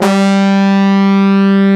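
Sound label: HORN SECTION; Greytsounds; Scott Peers Sound Engineering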